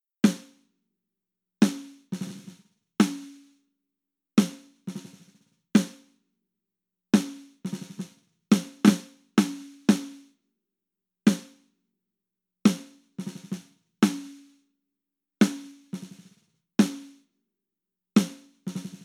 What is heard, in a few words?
Snare With EQ